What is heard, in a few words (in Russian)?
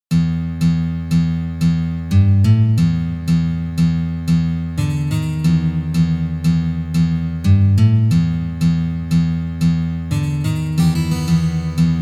na gitare